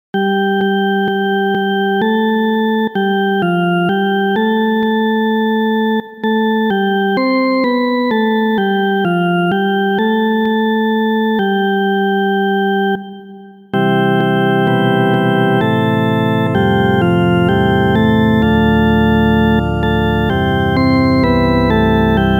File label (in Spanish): solo organo; gregoriano; ite Misa est